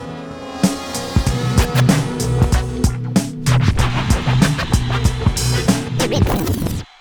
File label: Game won; NBA Street; GC; PS2